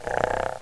Beetle Die

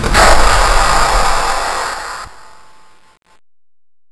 Drakan Attack

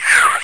Spider

